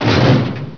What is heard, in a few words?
crash; assault